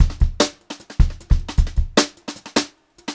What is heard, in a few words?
Brushed Drum Loops